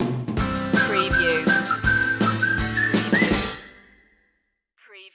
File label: upbeatful stinger